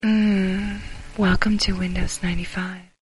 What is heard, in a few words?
welcome message tone